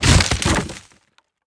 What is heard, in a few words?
pole laying; World of Tanks Blitz